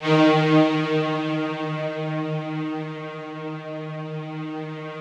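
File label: Yamaha VP-1, rough strings D#2; Music Store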